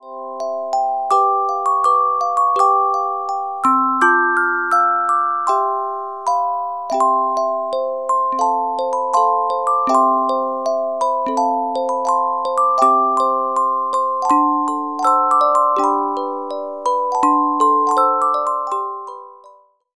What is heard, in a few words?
Carillon Music Box Melodies